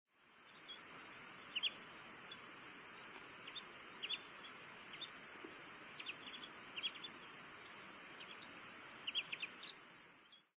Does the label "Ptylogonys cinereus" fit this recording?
Yes